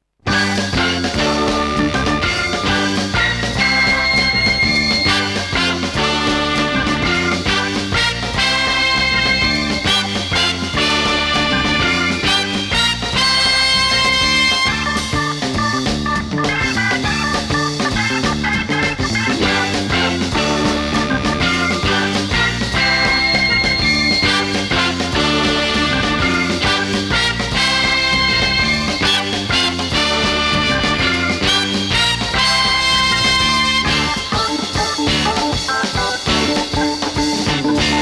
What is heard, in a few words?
Organ Mania; KPM 1000 series; Soul Organ Showcase